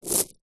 household necklace plastic pearl set down or drop on carpet